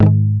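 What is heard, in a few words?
GUI G#, acoustic guitar; Best Service Gigapack 2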